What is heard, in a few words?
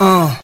uh